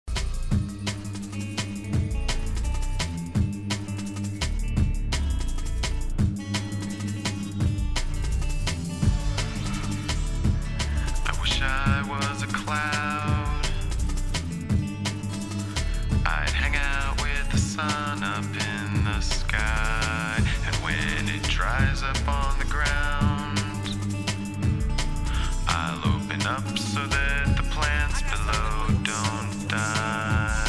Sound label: Drum & Bass